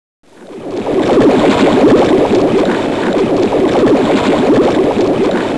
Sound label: LONGBUBS